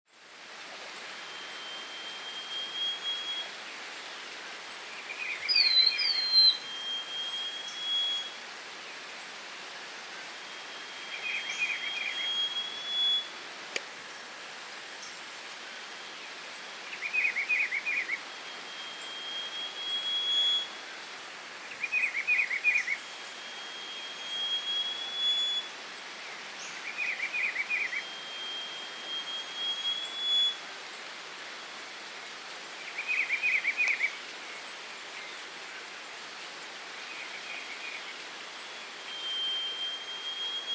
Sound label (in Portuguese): Canto do saudade de asa cinza